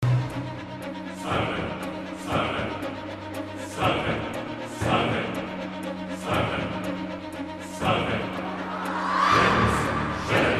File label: scream; Satanic Chant & Orchestra; RSVP VOICES; Audio Network